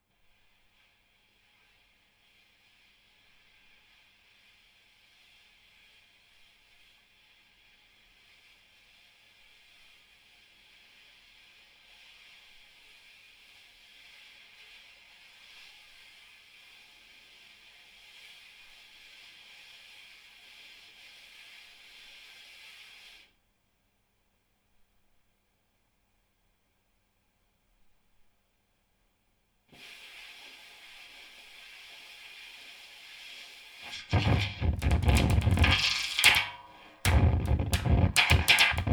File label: Snare